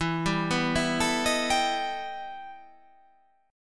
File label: E Minor Major 11th E